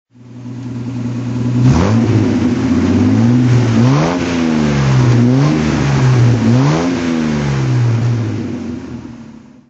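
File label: healey3000mk1 throttle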